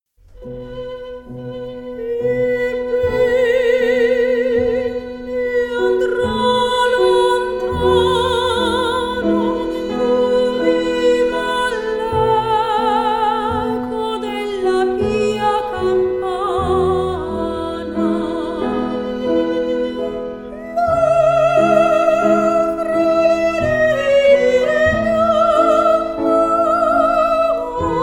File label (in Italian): soprano